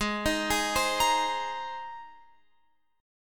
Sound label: Ab Major Add 9th Ab, Guitar Open F; Abadd9; arpeggiated; x,15,15,15,15,17